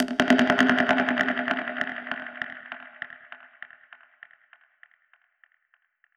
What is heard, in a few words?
Perc Hit, dub percussion one shots; musicradar